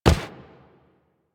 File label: Walk Dirt, Footsteps; Warframe; Excalibur